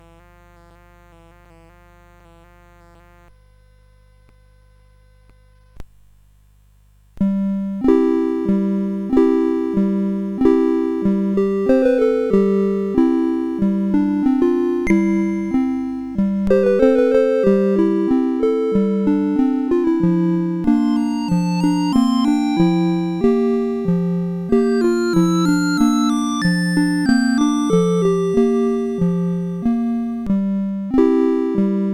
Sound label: Acoustic, CSG8580R5